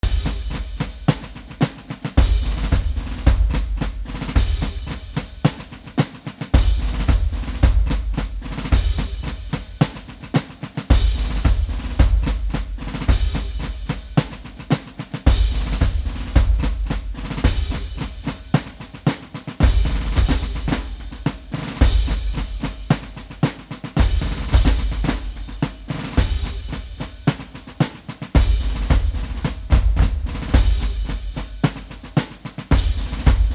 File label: marching drums drum solo